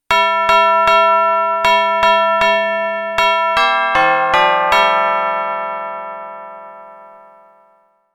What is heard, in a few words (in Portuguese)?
campainha jingle bell efeito sonoro